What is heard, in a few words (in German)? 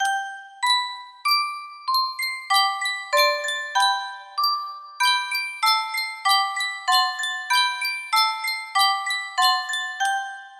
yunsheng spieluhr